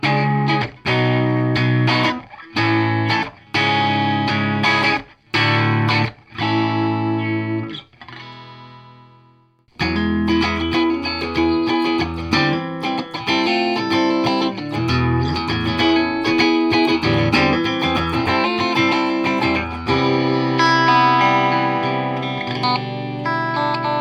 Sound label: chords